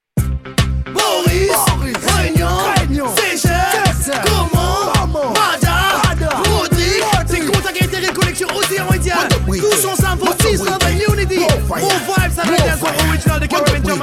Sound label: Indian Ocean Inna Dancehall